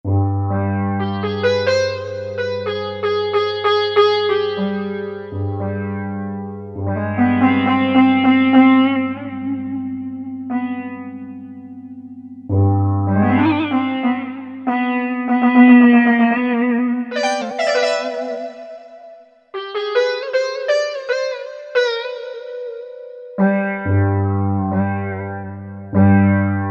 Sitar; Korg MSC-10S Ethnic

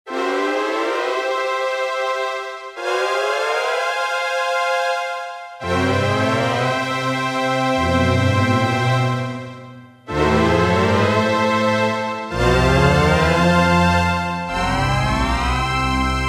Syn Pad

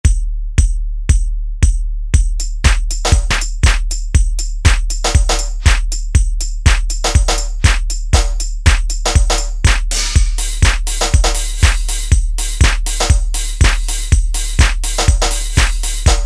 Dance 2000, E-mu Pro-Cussion; Factory ROM kits

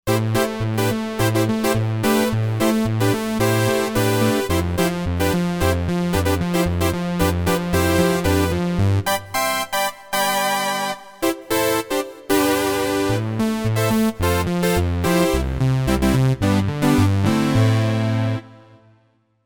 Punch Boy

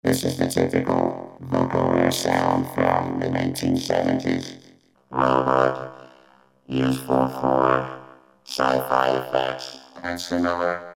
Vocoder Pulse, Korg MS2000; Factory Patches

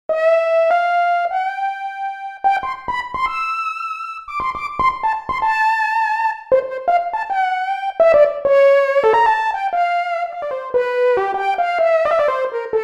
Soft Lead, Roland JV-880; Factory Patches

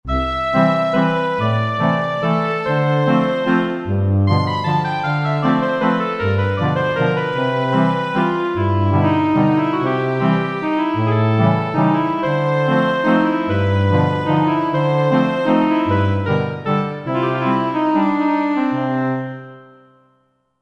Roland JV-2080 Str + Winds

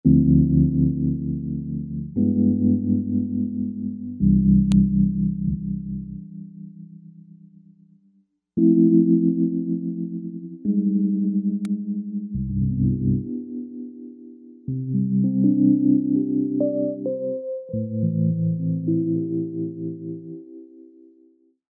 Melow Trem; MelowTremSRX

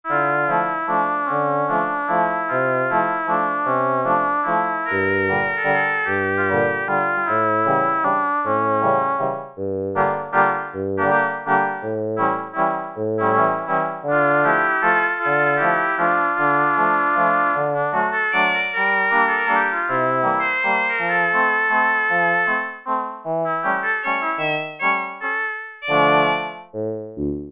DOUBLE REED, Oberheim OB-8; Factory Patches

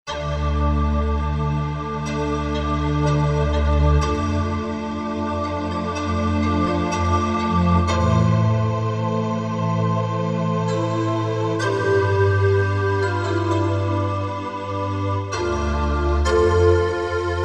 Wind Pad; Korg MSC-1S Variety

